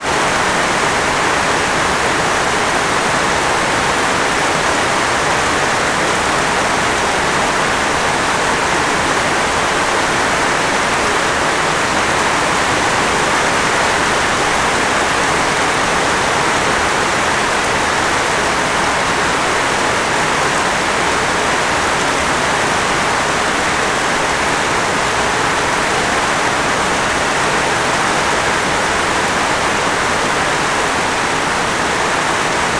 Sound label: nature rain med heavy roof sub tropical